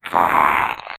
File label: hurt sound creature